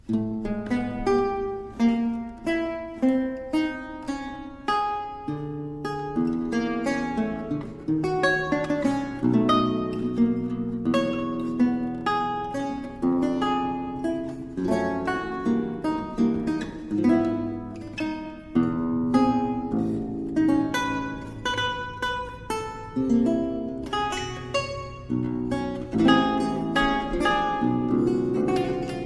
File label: lute suite basse danse